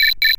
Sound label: notifications; RED Hydrogen-One